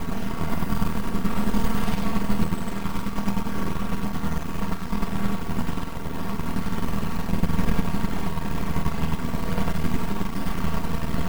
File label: Drone Ambient; Drums & Drones